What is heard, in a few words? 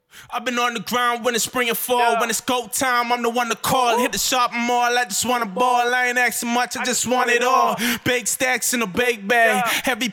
HH 129 Bm